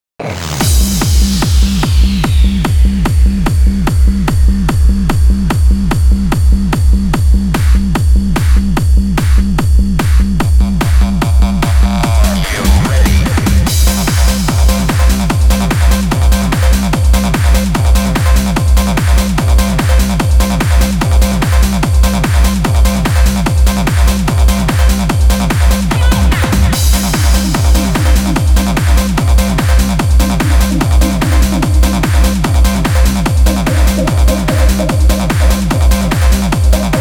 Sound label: Trance Remix; J-Core